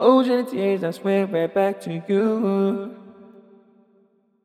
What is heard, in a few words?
Vocal Loop 89 BPM A Min; Ocean Tears; Cymatics; ANALOG EVOLUTION Beta